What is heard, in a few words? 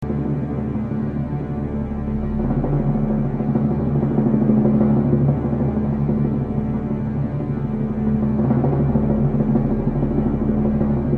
Drumbeat